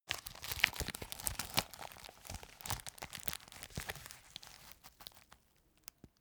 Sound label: plastic tobacco pouch handle